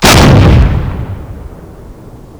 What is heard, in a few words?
tiger cannon fire; WAR